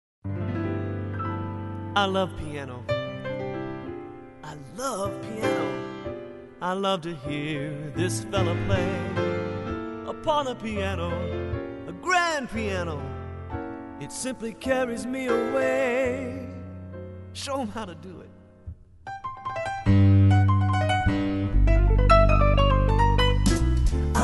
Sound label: piano